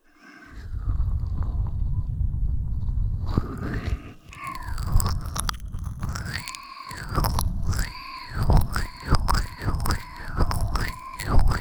脳バグ まったり MIX 右耳, ASMR

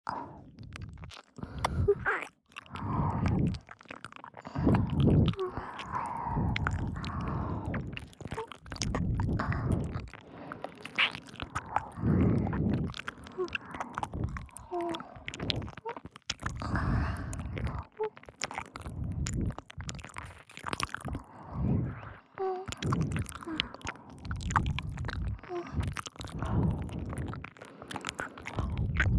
耳舐め

